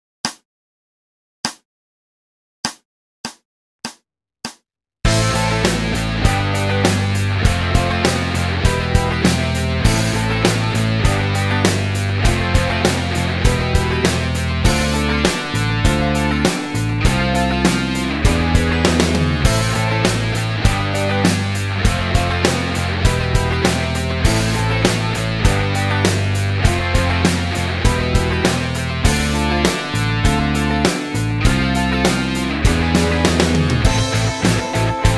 Rock Backing, rock guitar; RGT

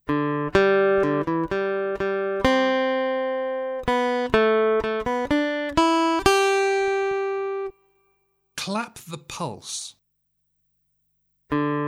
Aural Rhythm Test, acoustic guitar; Grade 4

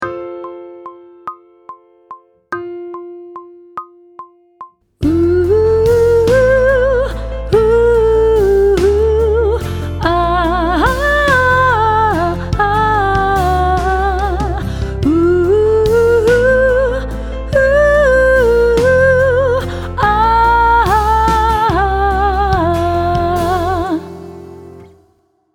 Sight Singing with vocal, pop vocals; Grade 4

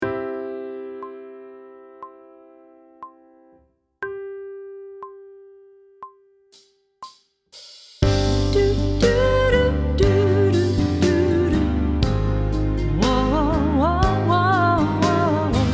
Sight Singing with vocal, pop vocals; Grade 8

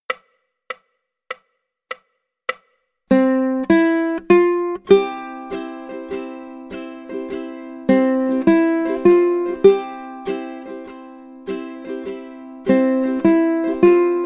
When The Saints, ukulele

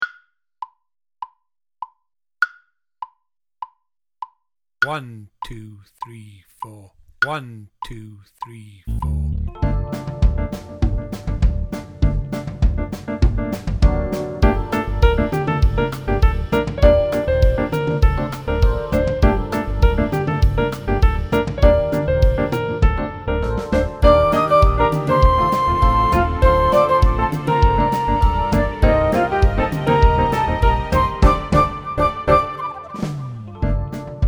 Amazonia Full Mix, drum kit

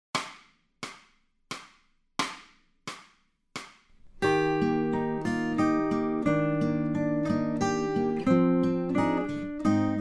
Accompaniment, acoustic guitar; Grade 6

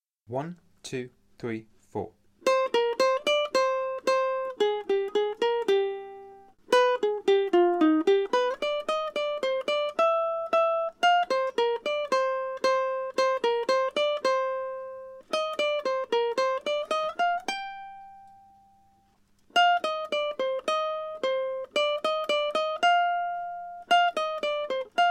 Accompaniment, ukulele; grade5

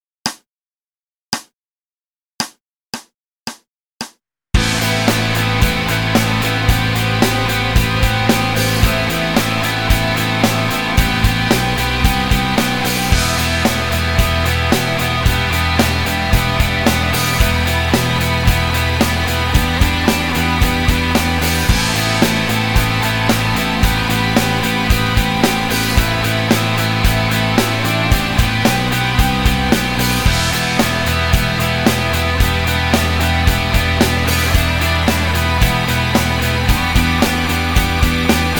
Rock Backing; rock guitar; RGT; Quiet As A Bulldog

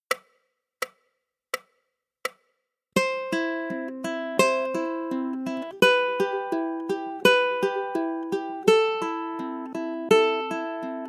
Fingerstyle Study, ukulele; grade2

